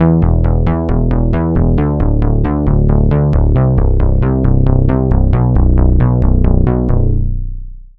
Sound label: Bass